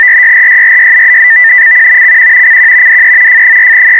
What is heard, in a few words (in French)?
Amtor, AMateur Teleprinting Over Radio